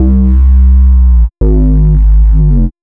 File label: DnB Bass 170 C, drumnbass 170bpm; musicradar; Basslines B